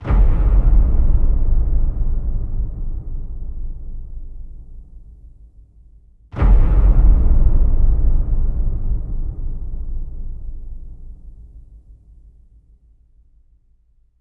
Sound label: Short Music Cut; Platoon